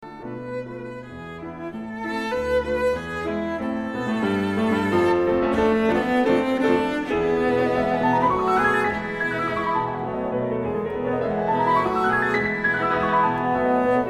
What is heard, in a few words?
Works for Piano and Cello Polonaise Brillante